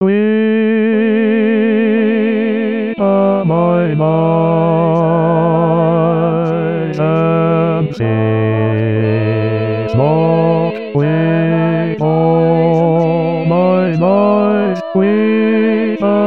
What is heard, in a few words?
Bas; Weep O mine eyes